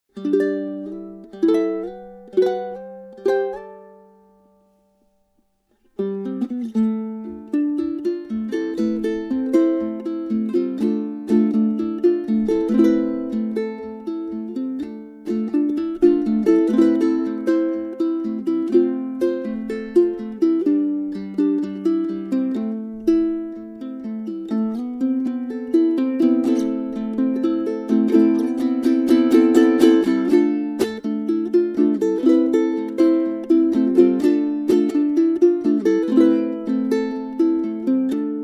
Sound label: uke